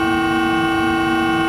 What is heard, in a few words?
Drop Hatch Loop; Doors